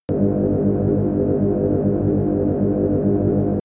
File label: spaceship engine interior high, sciene-fiction